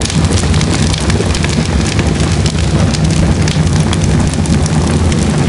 battle torch, ambience; Nimbus

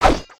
maelstrom shot; creatures organic

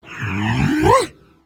idle, creatures organic

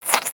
Frog Hat Slurp; Ribbit; creatures organic

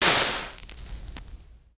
Fire Extinguish

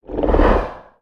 Flap Fire Breath; creatures organic; Bruno

